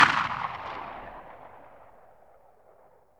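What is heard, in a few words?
rifle distant shot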